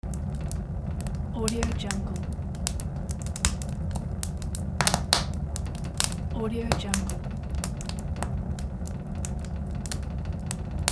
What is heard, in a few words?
fire